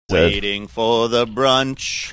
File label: singing waiting for the brunch